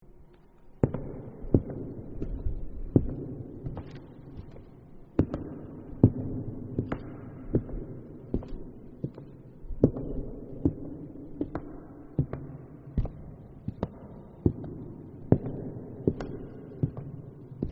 footsteps in hall

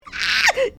screech aaaah

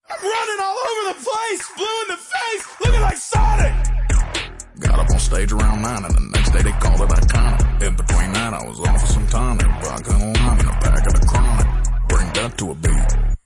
beat; bubonic

